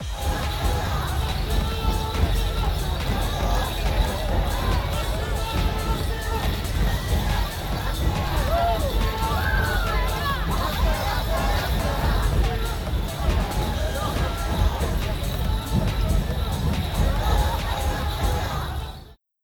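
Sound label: Black Rock Campsite right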